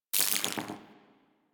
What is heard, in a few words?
Minigun Mech